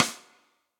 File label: Snare Sidestick; Drum Hits; Cold Tight Room; Session Drums Studio; Standard Hits